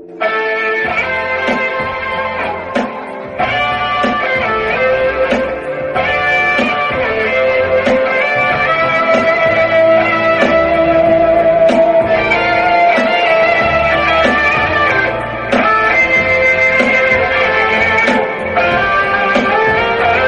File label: guitar ringtone